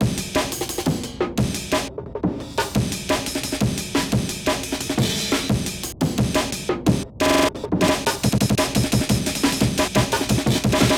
175Bpm Amen Breaks Remixed

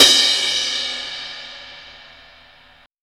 Ride set, Drum and Bass; Ride Set 1; Instrument Samples

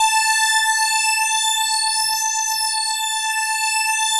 PHAT ACID A6; PHATYACID

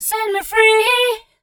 f mel d, fem Vocals

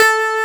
SYNTHESIZER DIGI DECAY; AKAI S6000 CD-ROM - Volume 1

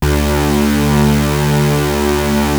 Prophet 5 D3, Synthesizer; Hollywood Edge - Giorgio Moroder Rare Synthesizer Collection

